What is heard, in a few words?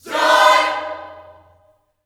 JOY CHORD, Vocal Gospel; Spectrasonics; Vocal Planet Vol.1 Gospel; akai